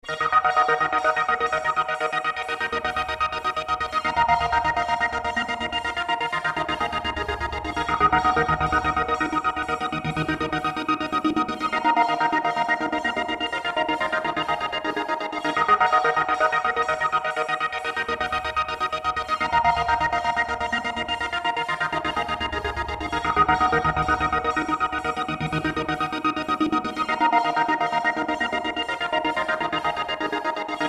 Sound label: pad